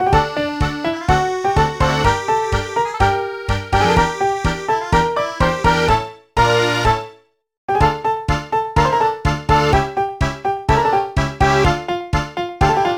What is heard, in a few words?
Musical ()